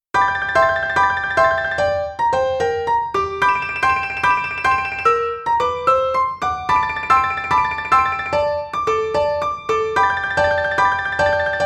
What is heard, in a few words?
piano